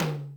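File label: 606 high tom; Crypton - DrumBase5000; TR-606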